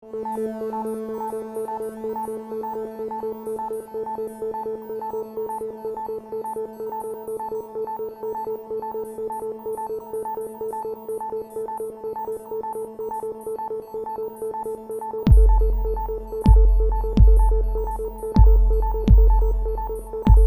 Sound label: A Walk In A Forest, Little experimentation with a drone and some noises